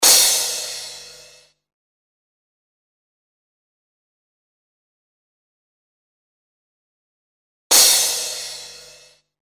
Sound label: Bright Crash, Cymbals; Industrial